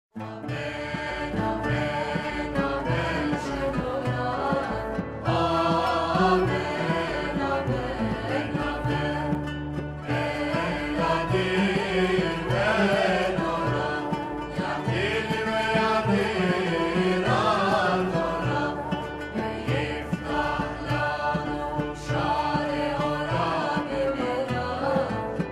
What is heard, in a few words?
AMEN AMEN